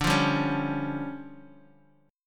D Minor Major 7th Double Flat 5th, D, Bass Standard; DmM7bb5; strummed; JGuitar